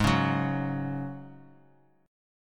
G sharp 7th Suspended 4th, Bass Standard; strummed; chord; x,11,11,11

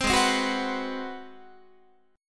G sharp 7th Sharp 9th, Guitar; strummed; 15,16,15,16,15,17; 4A,4E,5A,5D,5G#,6C#; G#7#9

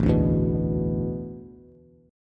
A Suspended 2nd Suspended 4th, Bass; Asus2sus4 strummed

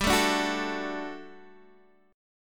G Major 7th Suspended 2nd G down Guitar Open D

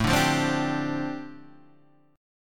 F 6th Add 9th A, chord

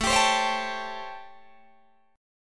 A 7th Sharp 9th, Guitar, Open D

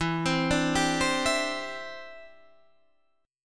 E Minor 7th Sharp 5th E, Guitar Half Step Down

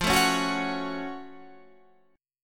Gb Minor Major 7th F

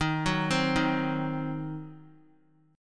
Eb Minor 6th, Guitar; Ebm6 arpeggiated; JGuitar

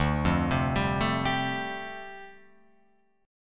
D Minor 11th D down Guitar Open F, Dm11 chord; Dm11 arpeggiated; JGuitar

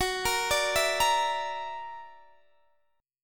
F sharp Augmented 7th F sharp Guitar DADGAD

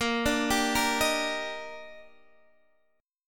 Eb Major 7th Bb

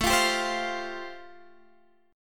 C Suspended 4th, A, guitar chord; Csus4/A; strummed; chord shape

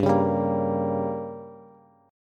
G Diminished, Bass; strummed; JGuitar

